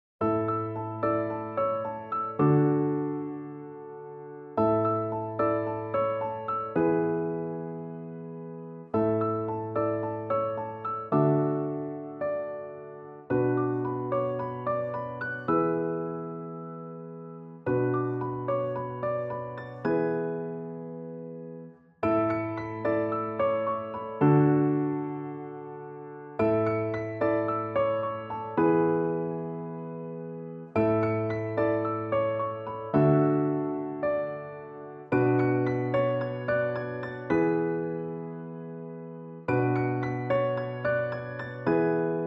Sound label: Piano and Clarinet Only